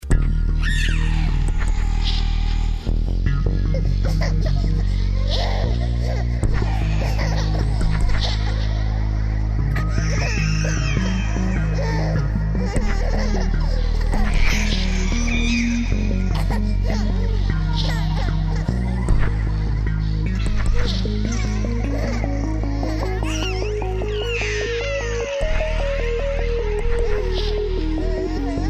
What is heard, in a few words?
Sweet Melody